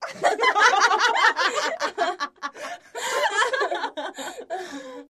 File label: Laughter Several Fem, female